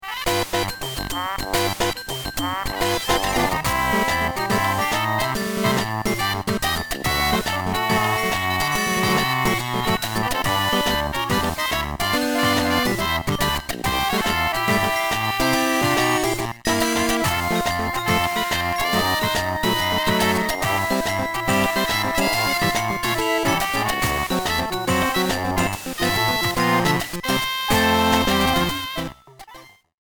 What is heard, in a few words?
Gambling Quest Accepted; Shroom City